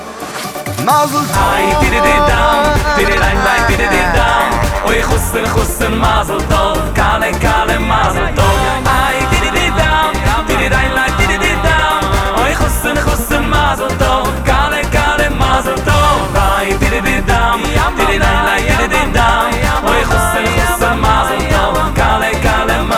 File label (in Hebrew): צלצול לחתן וכלה